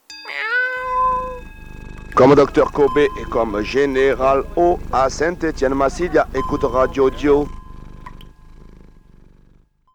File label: Jingle radio